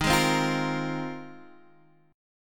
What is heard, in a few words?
F Minor 11th, D sharp